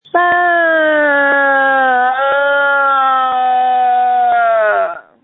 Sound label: screams